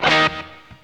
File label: CHORD, Stereo Guitars; E-MU Formula 4000 Series Vol. 1; Hip Hop Nation